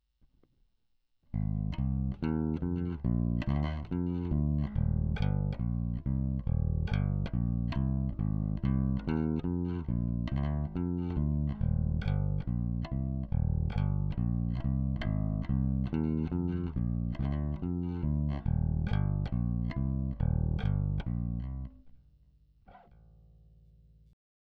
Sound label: Clean Combo